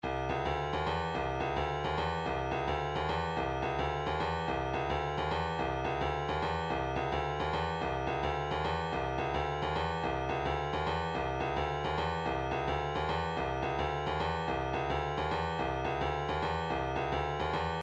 vocal planet latin, midi

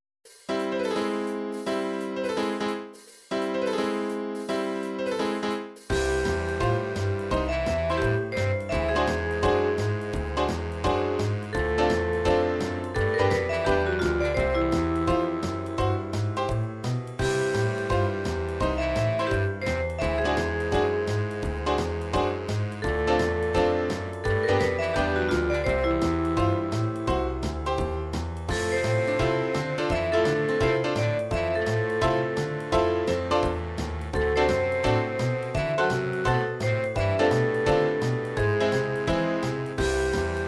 jazz

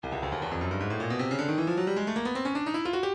breakbeat 155; Stylus; frenetic

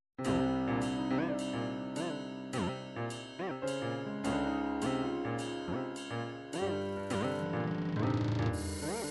triphop; Newtronic Triphop Construction Kit

